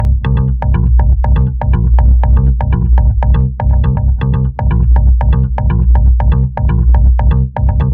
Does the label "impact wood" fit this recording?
No